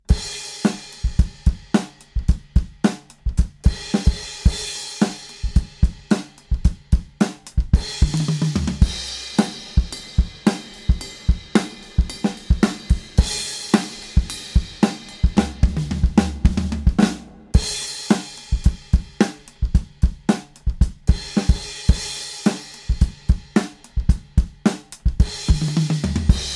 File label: Vermona DSR 3 Drums Dual Mono